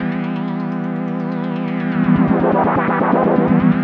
PAD 125 Em

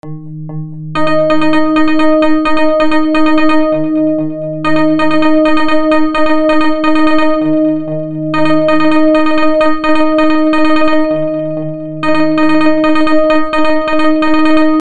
128 A drop

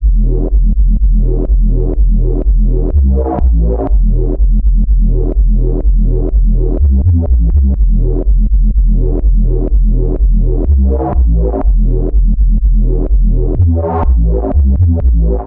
124 E Synth Bass

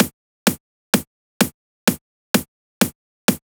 loop top kick 128

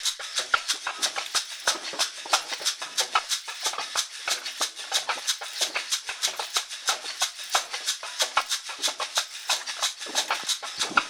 92 top loop; orange

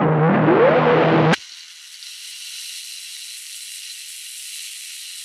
100 synth loop Eb; VINCENT; blistering idiot

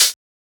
Hi-Hat Closed

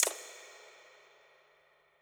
Verb Snare

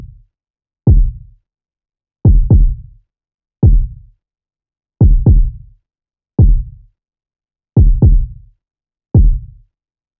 174, Kick Groove

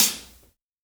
Hihat

